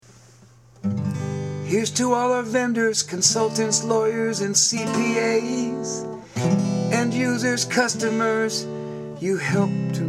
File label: song; PCSC Vendors